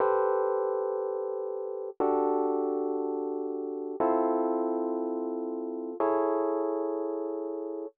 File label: 120 Rhodes A, Keys, Nu Jazz House; PowerFX